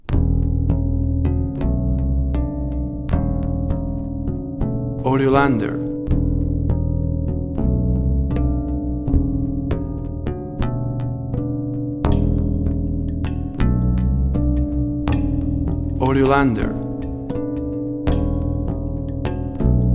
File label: plucks ticks